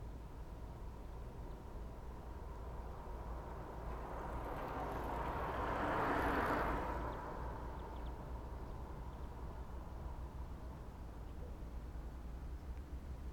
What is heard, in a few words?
Bicycle Passing